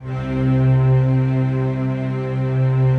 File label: PAD, DIGI-PAD